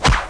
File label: bow; dungeonmaster